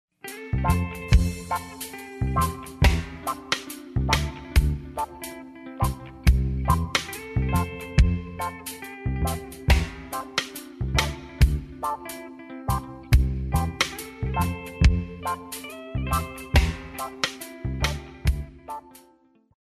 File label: raggae Happy Way